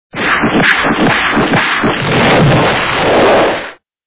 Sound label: bang bang